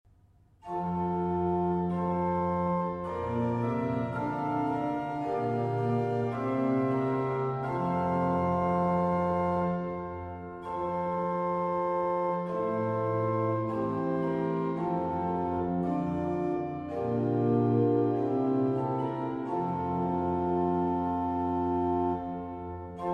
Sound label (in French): Variations en fa majeur, orgue